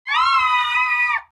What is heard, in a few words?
Floaty Baby Roar; The Binding of Isaac